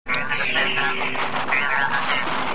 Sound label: probe droid sms